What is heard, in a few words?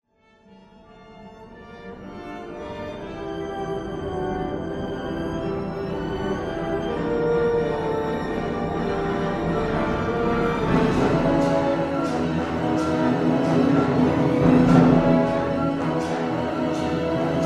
Fanfare